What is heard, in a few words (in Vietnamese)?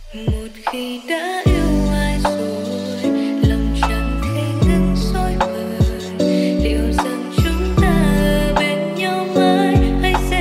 Nhac Chuong Lofi Ver